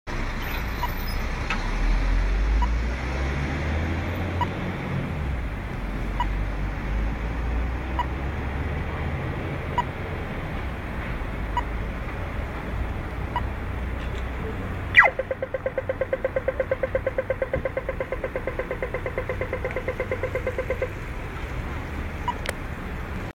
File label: traffic, dublin